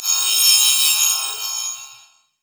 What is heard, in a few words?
burst of speed; LoL; fiora